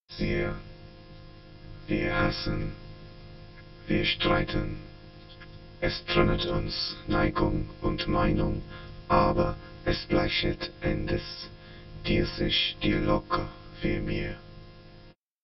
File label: Fm 60 vocoder